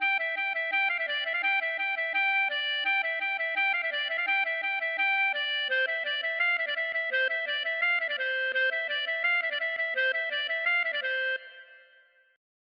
maraichine

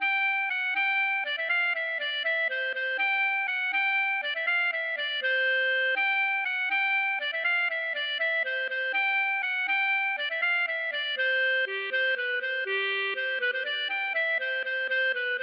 bourree

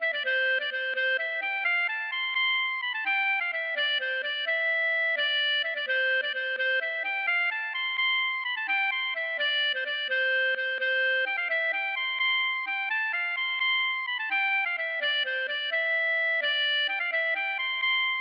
melodie Ecossaise

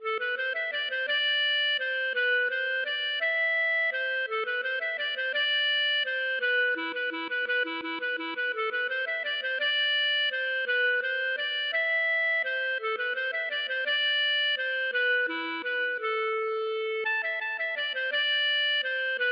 valse

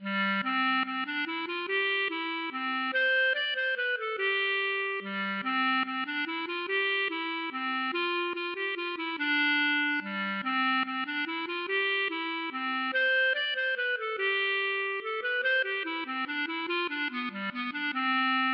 valse Ecossaise

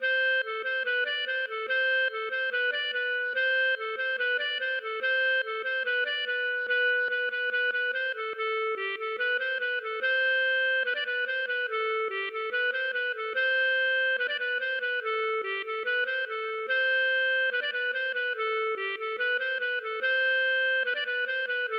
laride

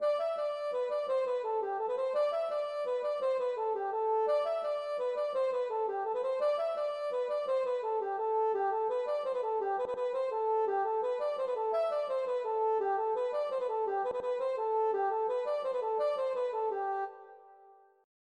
ridee Trad

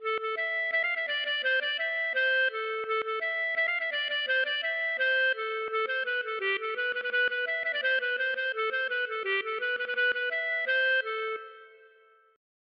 maraichine; Maraîchine : P'tit bonnet vert

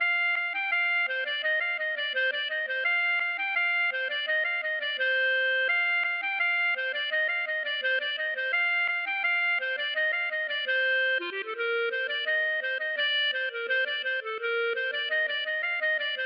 marche

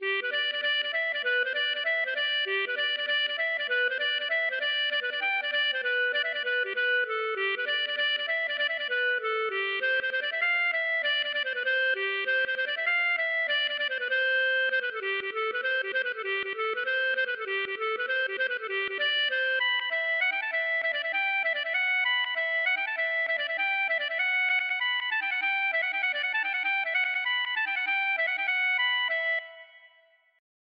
branle Noirmoutier

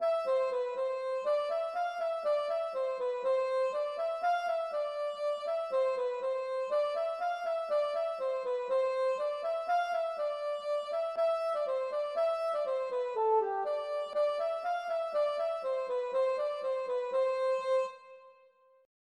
rond Sautron

